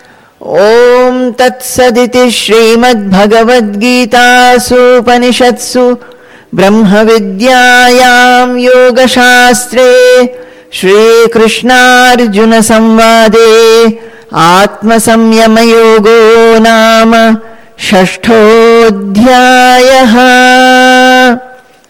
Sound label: Ending Chanting; chapter 6 dhyana yoga; bhagavad gita; conclusion